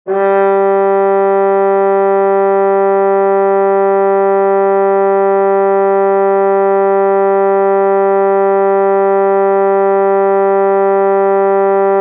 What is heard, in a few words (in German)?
800Hz Posaune